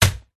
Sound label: cat block